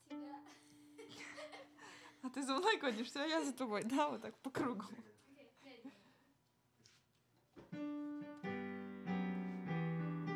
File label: Viola D